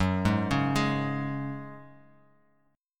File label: F sharp Suspended 2nd, Bass Standard; arpeggiated; 14,11,11,13; down